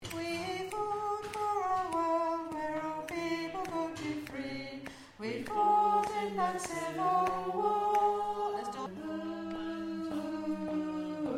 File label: Alto